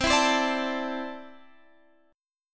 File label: D 7th Suspended 2nd, chord